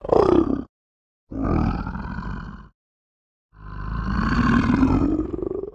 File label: Growl